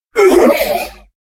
death

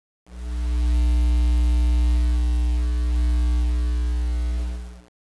machine

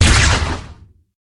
crylink fire, weapons

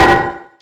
grenade bounce, weapons

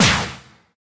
lasergun fire, weapons

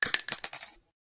brass, weapons

